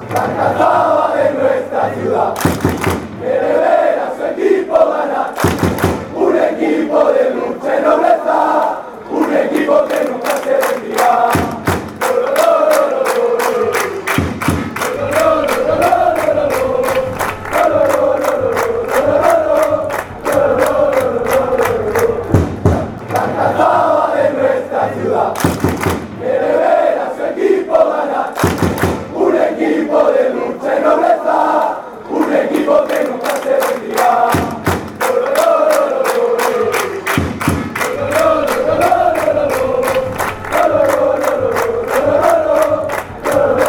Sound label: ole ole, chants; almeria